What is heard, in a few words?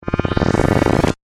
Laser Scan